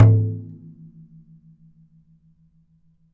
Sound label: MADAL, DRUMS OF IND